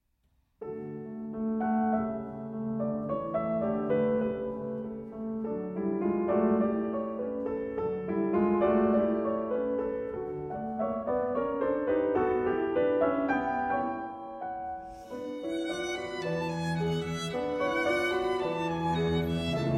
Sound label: Trio in D Minor, Lied. Allegretto; Op. 11 III.